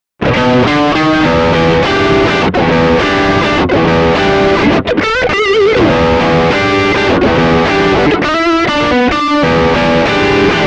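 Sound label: Fender Przester G, classic strat single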